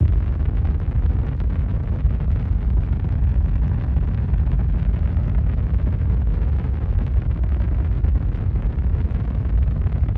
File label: Rocket Launch Boost And Burning